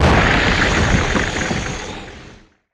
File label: asteroid damage carbonic huge; Factorio